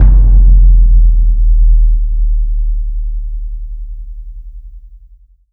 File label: huge bass drop